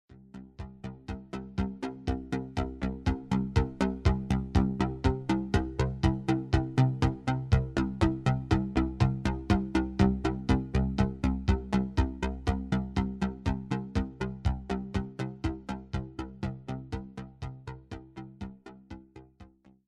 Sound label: Retro Beat